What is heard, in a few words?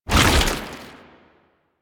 Tank Infested Arm Move, Movement; Warframe; Lasrian